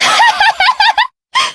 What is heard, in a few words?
laugh